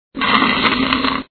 SFX Slushie sound; Bin Laden Liquors

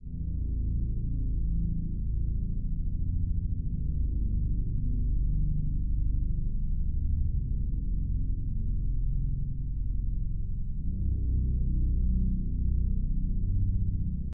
the end death background; don t be afraid the first toy; DBA

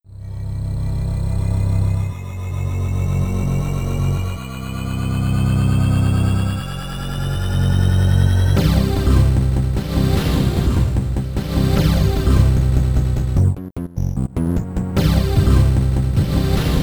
Loading Tune; Disposable Hero; Amiga

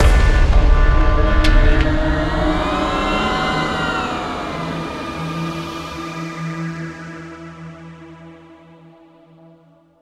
Transition; Stacey

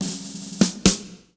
Promotion; Trenches

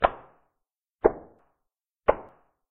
Caverns Walking; Five Nights at Treasure Island 2020 Remake; windows

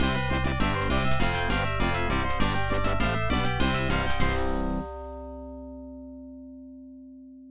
Success; lemmings 3d pc

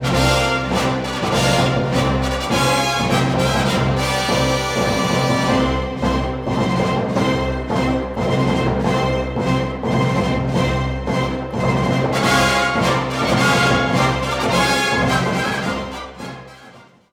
Battles Rebel Win; Hoth

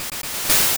Bubble; sfx; attack moves; pokemon; gen 2; gsc

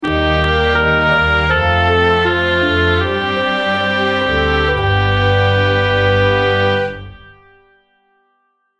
Jingle Fantasy

